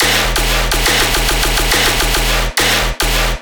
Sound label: Bass Loop E 140BPM, Dubstep; AC2024 - Day 13 - Dubstep Sounds 2025; Glory Bass